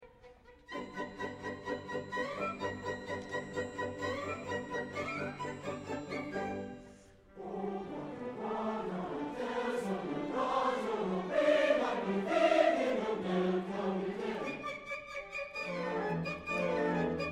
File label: Anvil Chorus excerpt, community choir; “Anvil Chorus” from Il trovatore by Giuseppe Verdi (1813–1901) Performance excerpt; Conductor: Susan Davenport